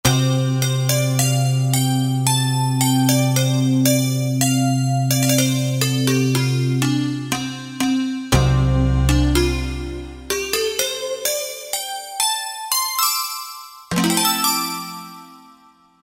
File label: Ming Dynasty, Roland JD-990; Factory Patches